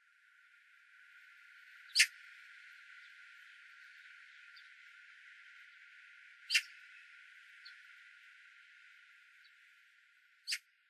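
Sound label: magpie, muroran; kasasagi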